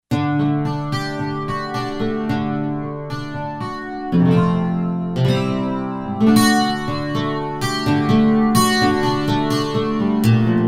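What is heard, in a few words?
Acoustic Guitar with Chorus; Roland SC-880; Factory Patches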